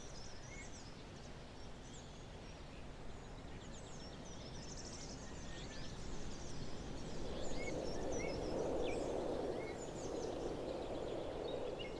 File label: construct ambience